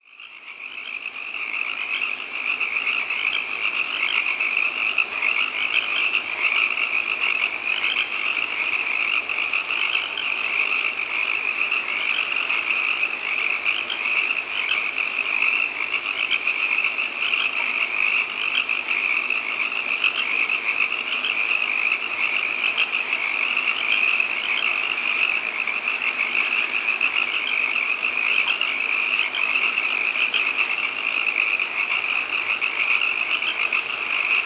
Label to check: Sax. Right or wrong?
Wrong